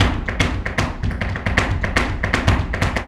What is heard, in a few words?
Flamenco, ZAPATEADOSOL